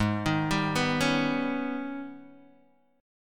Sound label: G sharp Minor Major 11th, Guitar DADGAD; 6,6,5,4,4,x